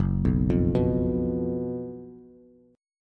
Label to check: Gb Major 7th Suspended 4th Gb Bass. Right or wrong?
Right